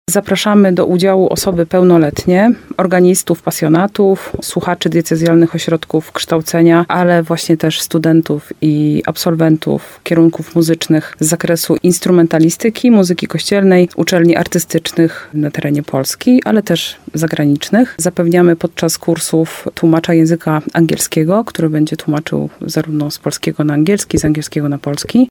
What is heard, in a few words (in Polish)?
organy; w nowym saczu